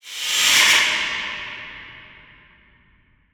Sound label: Reverse Fx; musicradar